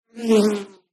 animal insect bee wasp fly by

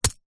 warfare knife throw dig into grass hard

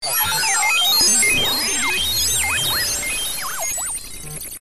science fiction robot big glitch; zapsplat

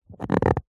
rubber short rub creak; foley; zapsplat

